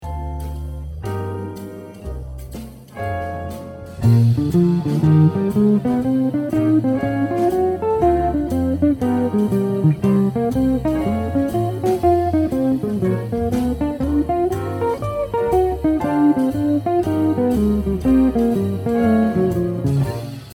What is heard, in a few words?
Lick